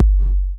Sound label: KIT HOU; Best Service